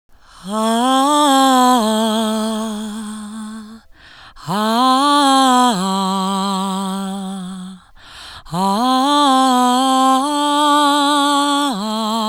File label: Low Ah vocal; Construction Kits; VOCALS; Am 60Bpm; Ghosthack; SkyRealm; Kit 03 - Winterhold